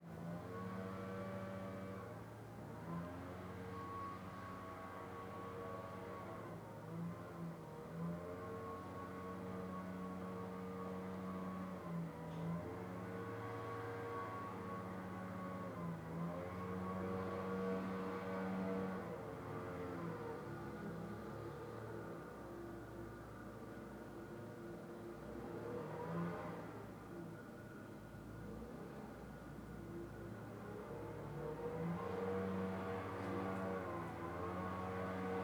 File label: Leaf Blower Closer; Blackmagic Fairlight